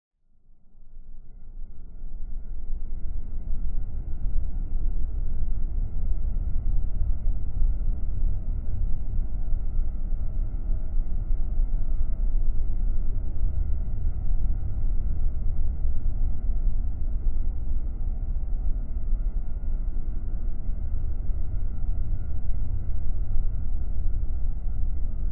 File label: science fiction drone atmosphere short airy subtle whining